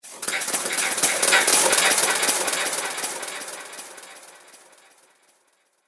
Broken Flyby, whoosh

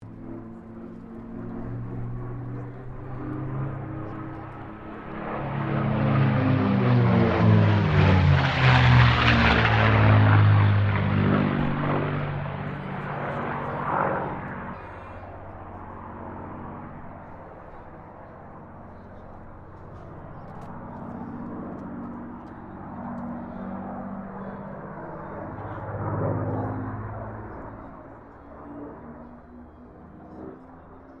AIRCRAFT CAC CA-18 MUSTANG MK23 PASS STEREO

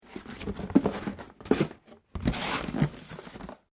Cardboard Box Close; Material components for constructing more complex sounds; Materials

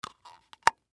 Silly String Close, Silly String Close Cap; Lid Sound Effects; Foley; Objects; Blastwave

